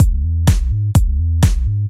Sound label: short groove tech house loop, kick loops; drum loops